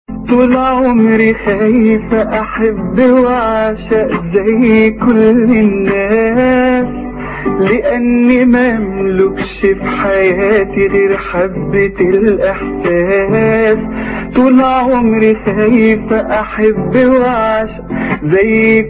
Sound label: solo; egypt